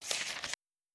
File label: turn Start